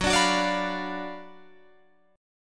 F sharp Major 7th Suspended 4th Sharp 5th, Guitar, Open F; F#M7sus4#5 strummed; chord shape